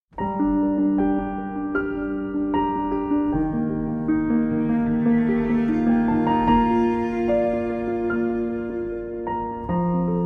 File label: String Quintet